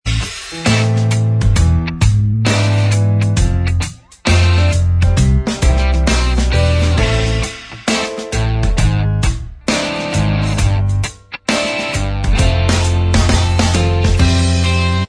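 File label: instrumental, backing track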